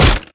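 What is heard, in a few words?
impact bullet light ballistic android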